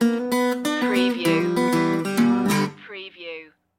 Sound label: blues stinger